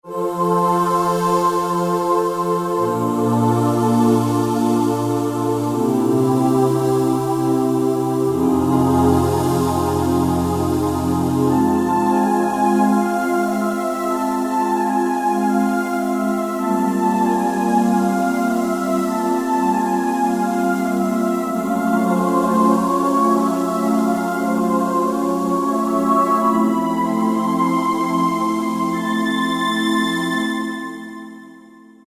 Breath Choir; Factory presets

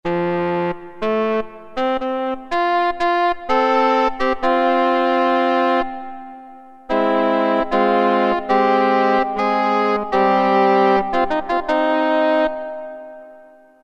Engl Horn, Roland MT-32

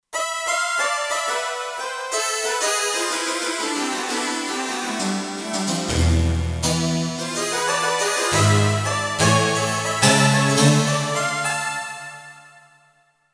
Hammer Violin, Kurzweil K2500X; Factory Programs

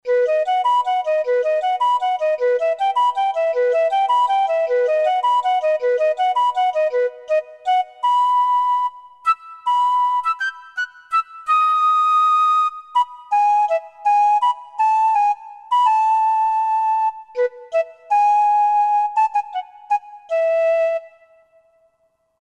Piccolo, Roland SR-JV80 Orchestral

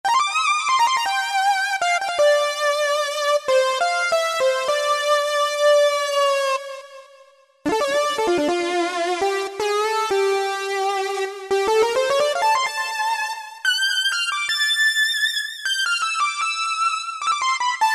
Mono Lead, Korg 05RW

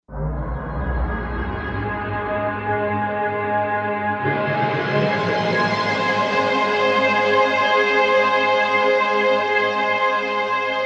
Start Orch, Korg M1Rex; Factory Patches

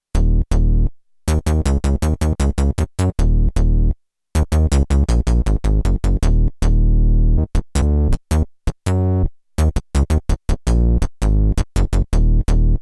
Tekno Funk Bass, Roland JD-800; Factory Patches

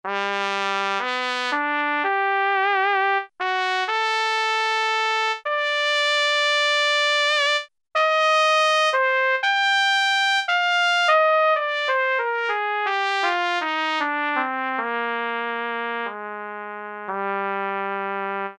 BRS Cornet; Brass And Woodwinds; Roland L-CDX-03

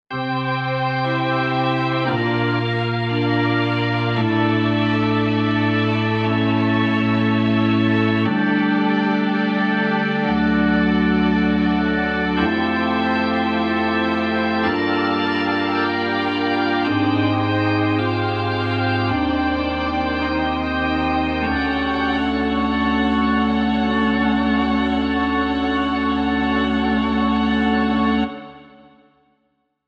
Tron B, Roland SRX-07 Ultimate Keys

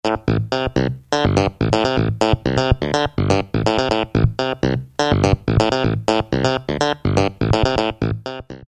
House Clavi Phrase, Roland MC-303

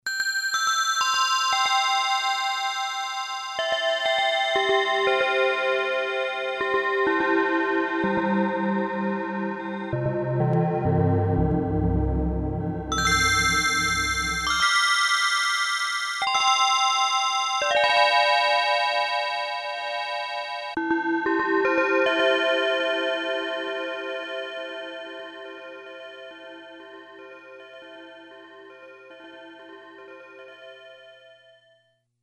Bells, Casio CSM-1; Tones

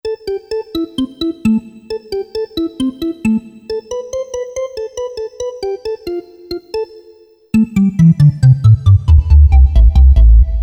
Generalmusic Equinox

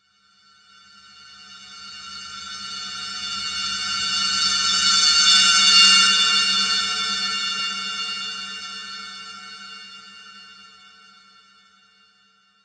Sci Fi Texture; Apple GarageBand 2; Apple Loops

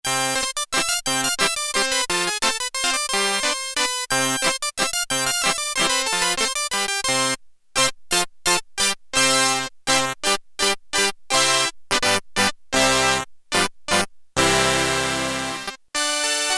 Harpsichord, Prophet-5; Sequential Circuits Prophet-5 (Ver.3); Factory Patch

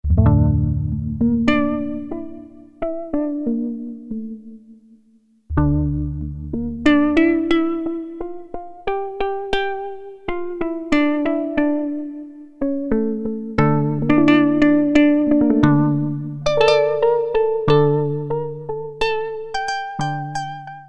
Syn Koto; Factory A

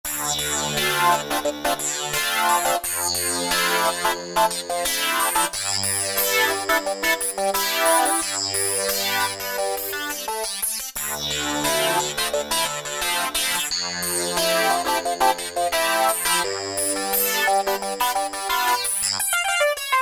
HyperClv, Roland SRX-07 Ultimate Keys

